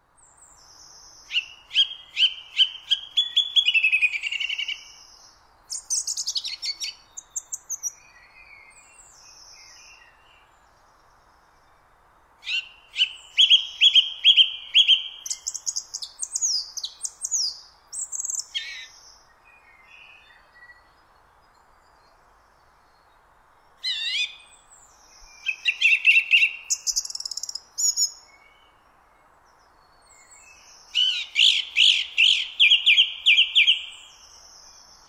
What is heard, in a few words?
song thrush